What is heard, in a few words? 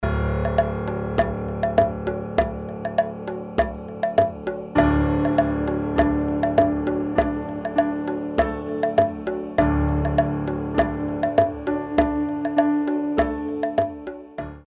Heartbeat of Africa Accompaniment